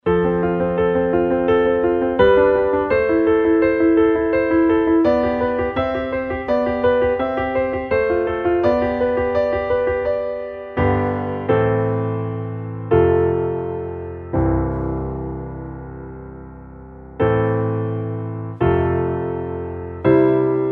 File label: Underscore PIANO; Worship Flow